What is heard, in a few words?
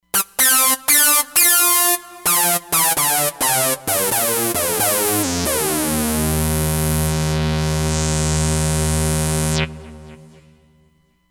Sync Tank, Roland Fantom XR